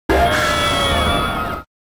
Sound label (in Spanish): Grito; Giratina